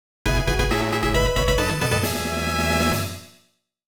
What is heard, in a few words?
Fanfare - Pokémon Caught; Pokémon FireRed and LeafGreen Remastered